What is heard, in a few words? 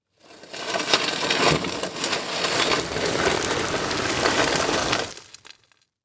Industry Tool Shovel Dig In Leaf Debris Long